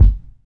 kick single shot f sharp key, Loudest frequency: 72Hz; kick drum; kick drum samples